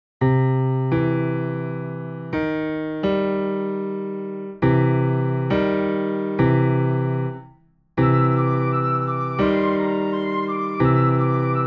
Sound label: music